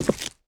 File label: concrete, footsteps